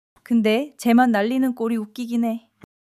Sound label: laughter; monologue